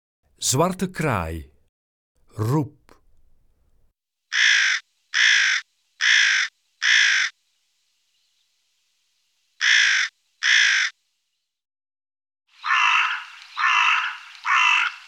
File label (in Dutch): Zwarte kraai, Zangvogels; IVN